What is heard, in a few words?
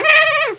cat pain